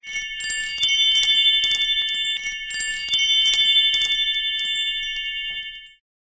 wind chimes crisp

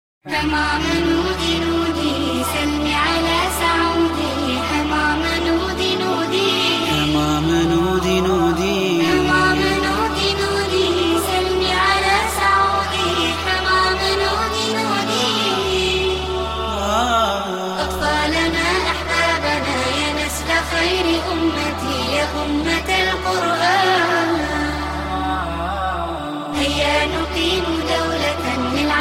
urdu naat ringtone; islamic ringtones

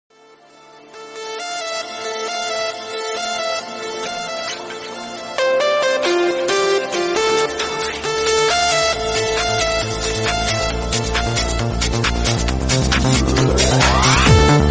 dance music tone ringtone; nokia ringtones

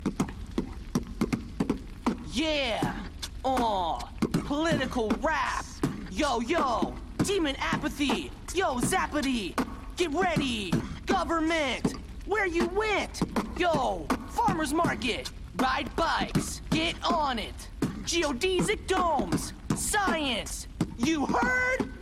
political rap ringtone

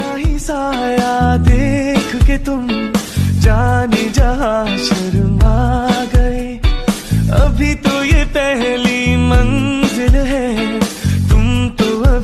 bollywood ringtone

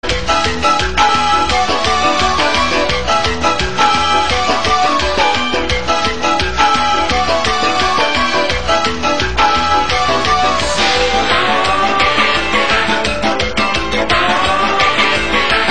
bim bam cartoonic musical tone ringtone

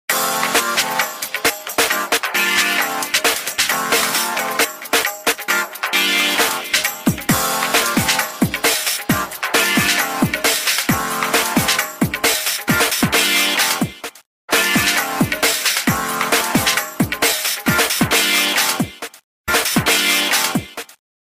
nokia ringtone; pepper

